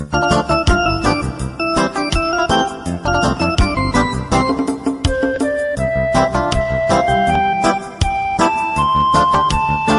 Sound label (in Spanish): m sicas de flauta